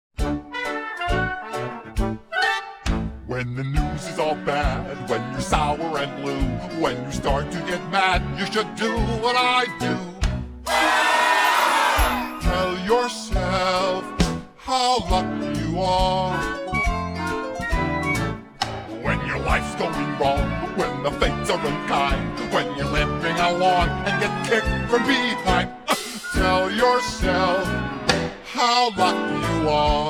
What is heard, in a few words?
vocal